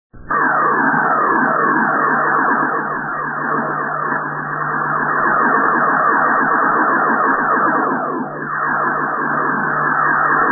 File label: 28 MHz tune